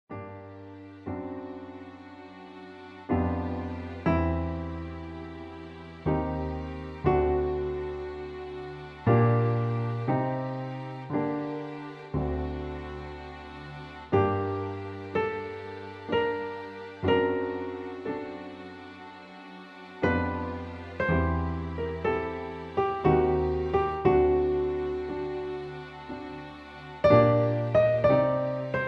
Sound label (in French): Accompagnement; Didon et enee